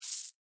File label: Insect Hiss Angry, Animal, Insect, Hiss, Angry, Bug; Insect Voice; Blast Wave